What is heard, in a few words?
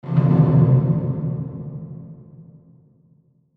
Hit Dark Hollow, Hit Dark Hollow Clank; Production Element Imaging Element Accent Transition; Blastwave